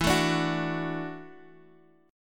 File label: E 9th Suspended 4th, Guitar, Half Step Down; chord